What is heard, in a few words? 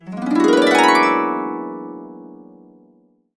E, Harps; USB Soundscan vol.62; Complete Harps; AKAI